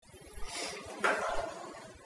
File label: knock and odd noise